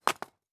Rock Impact; Survival Game Sounds - Lite Edition; Environment

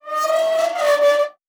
Rusty Metal Creak, Doors; Survival Game Sounds - Lite Edition

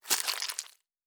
Blood & Gore; Survival Game Sounds - Lite Edition; Combat and Hunting

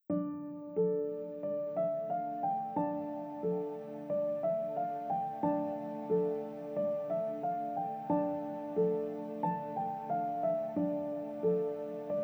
Light Ambience, Fantasy RPG Tracks; Free 25 Fantasy RPG Tracks Vol. 1

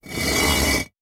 Rock Moving; Survival Game Sounds - Lite Edition; Environment

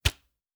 Arrow Shot; Survival Game Sounds - Lite Edition; Combat and Hunting

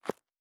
Dirt Run Surface, Footsteps; Survival Game Sounds - Lite Edition

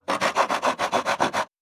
Saw Wood, Crafting; Survival Game Sounds - Lite Edition

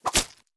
Stab; Survival Game Sounds - Lite Edition; Combat and Hunting

